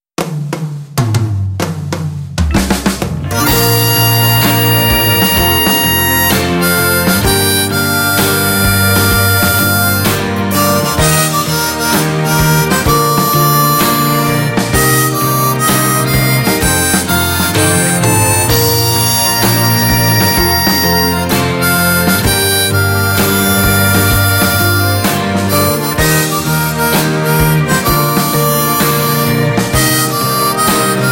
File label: pops